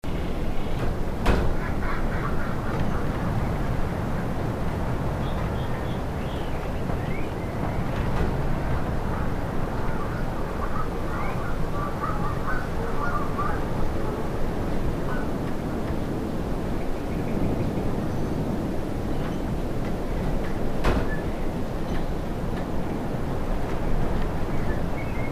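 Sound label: Shed Shack Movement, ambience bed loop; The Unfinished Swan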